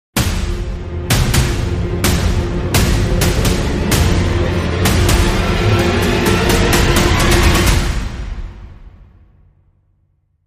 Dramatic Sting

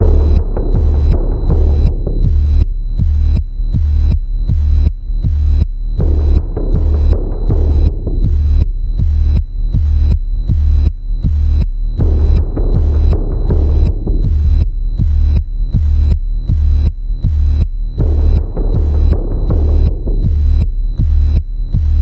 Panning Pulse D, Key of D

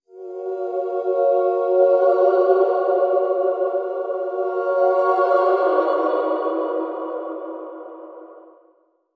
Link Sting

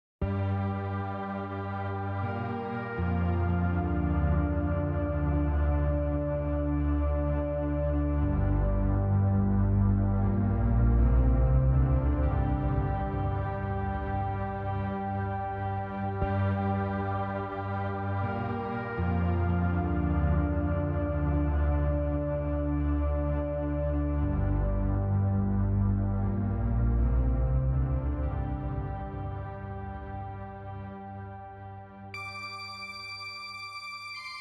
Pads Only

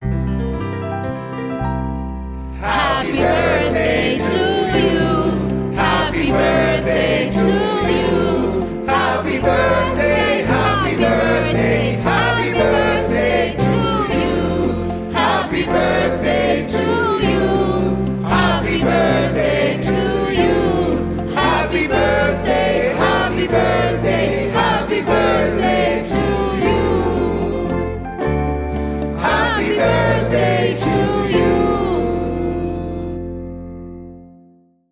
Adult Vocal Off Key Happy Birthday

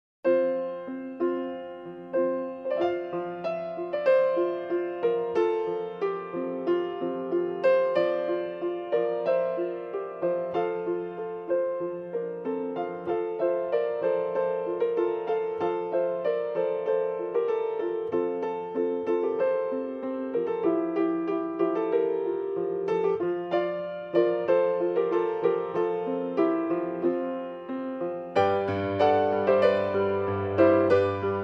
Joy To The World, Solo Piano

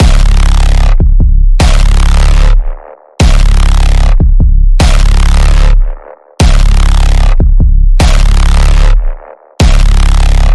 Feel The Pulse C sharp

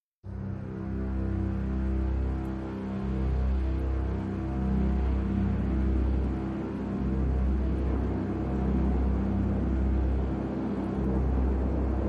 Drone Only

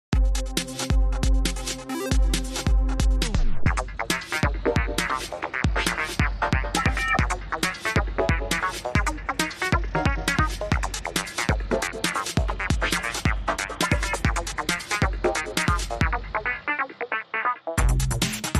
Electropunk

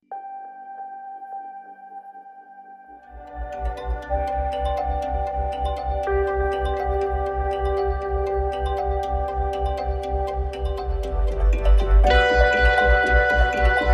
Mbira

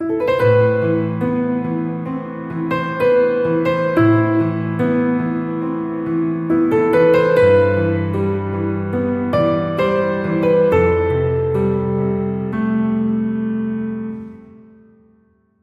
Piano

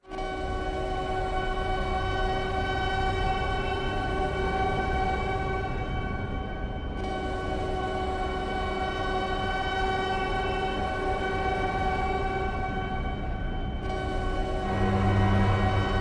Underscore

